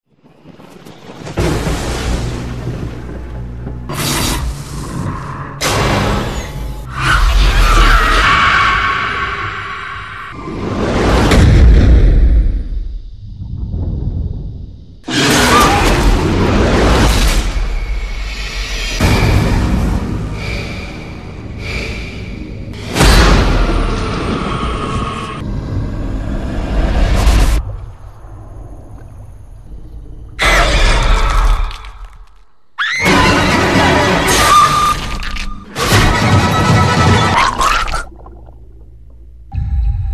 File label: Trailer Shock Track